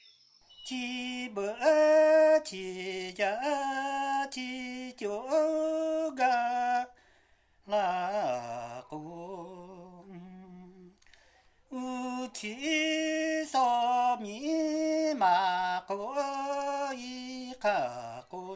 Lahu Meuneu song, song sung by male singer

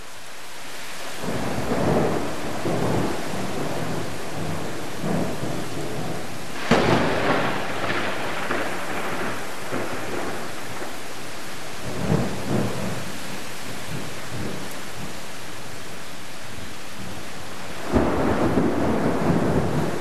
thunder

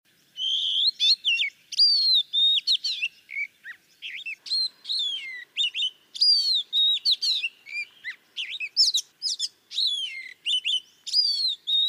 songsters

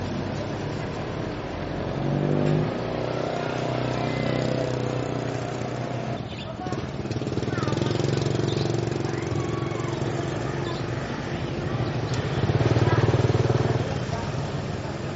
busy village street

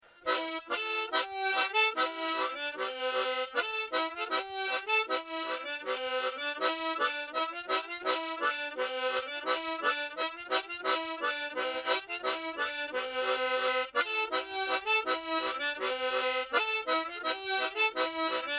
harmonica

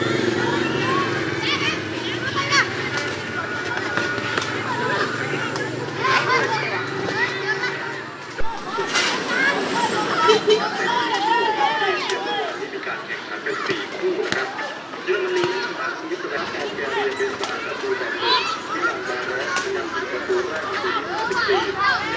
school

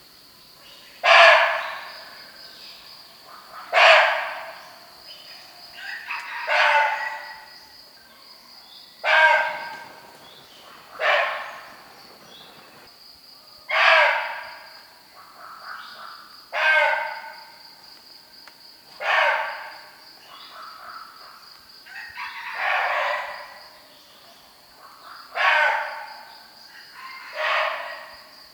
barking deer

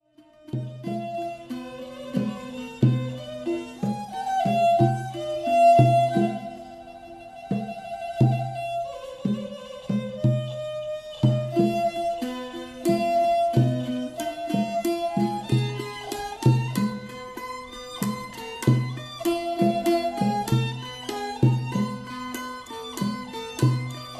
lamphun trio

